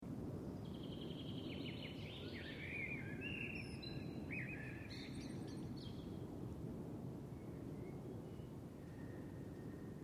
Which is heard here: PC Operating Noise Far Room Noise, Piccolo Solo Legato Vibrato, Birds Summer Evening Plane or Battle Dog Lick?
Birds Summer Evening Plane